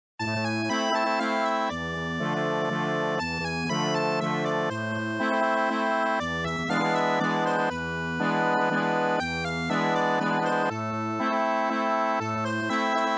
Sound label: Accordeon